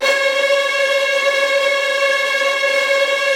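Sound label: STR TREMOLO, Virtuoso Strings, C#2, right; ILIO; Kirk Hunter Virtuoso Strings VOL-3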